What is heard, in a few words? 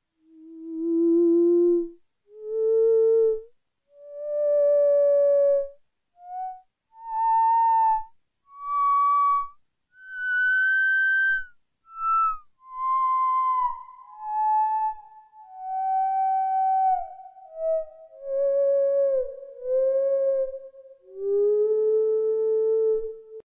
theremin